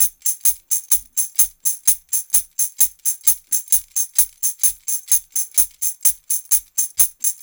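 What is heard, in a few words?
TAMB, tambourine; Drumdrops In Dub VOL-1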